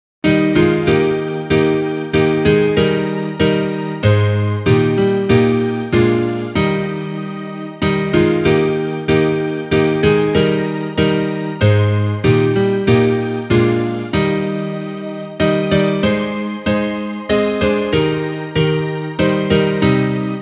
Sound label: instrumental; Vindo Cristo; CS I 42, H&C 28 - Jewels; Hinário "Hinos"